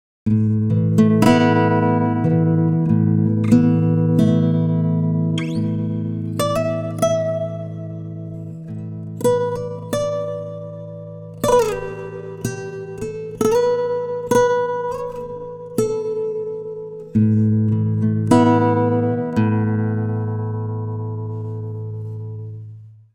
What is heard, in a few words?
timestretch guitar; i3 dsp quattro x